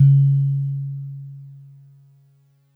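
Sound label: DreamLike DX100 C sharp 0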